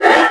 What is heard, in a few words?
breadmonster idle; tf2pets